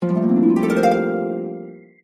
Orpheus, Message tones; Huawei Honor 3

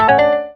Piano, notifications; Modern-Android; Cricket

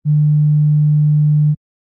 Vibration Call; Samsung; Galaxy S24; ui

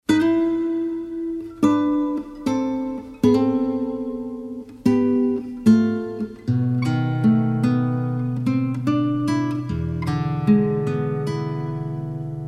strings, ringtones; Verykool; S757

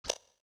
Slider sweep; Samsung; GT-S8600 Wave III; TouchWiz; TouchTone

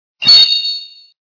Tone, Message

